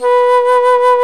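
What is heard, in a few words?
Alto Flute vibrato; FLT; A.Flt vib 2; Roland L-CDX-03 Disk 1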